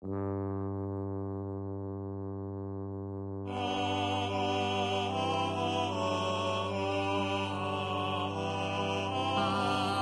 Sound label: Voice Drone Med Alto Tenor Bass; Bach Chorale 1; classical ear training